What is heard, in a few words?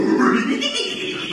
doorbell sound